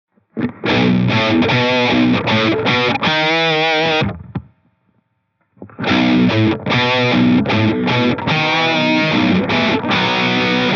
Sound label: Analog Tube BBD, echosystem